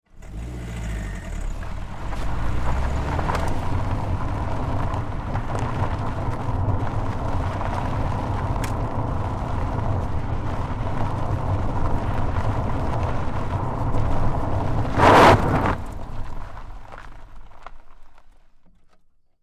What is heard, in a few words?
Car Tire Onboard Gravel Dirt Roll Fast Skid; Cars